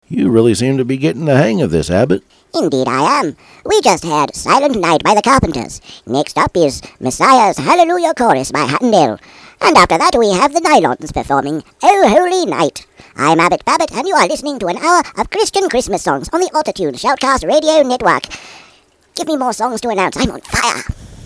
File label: chritmas songs